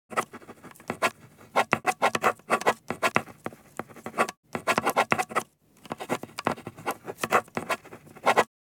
Pen writing on paper

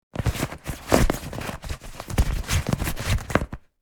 Inflatable armbands handling friction

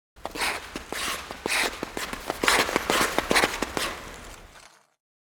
Ice hockey player skating movement

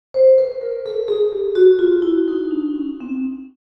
Vibraphone scale

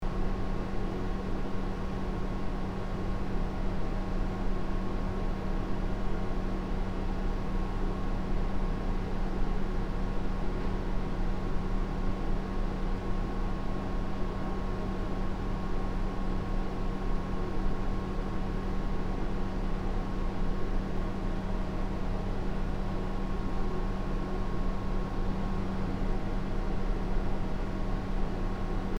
Hotel room ambience tone quiet loop

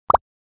Bubble pop

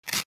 Paper ripping quick

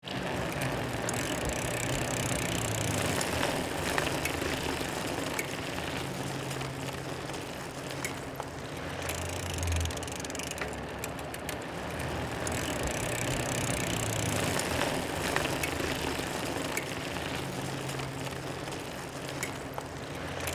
Bicycle speed bike pedaling at various speed

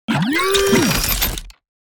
Sci fi blaster gun reload futuristic

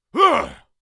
Orc creature surprised

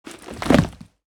Small travel suitcase setting down

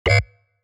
Action adventure game menu ui error

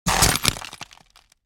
Footsteps on broken glass boots single step; impacts; gfxsounds

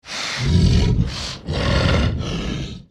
Werewolf breathing heavy monster

